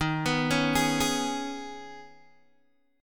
D sharp 7th Suspended 4th Sharp 5th, Guitar, Open G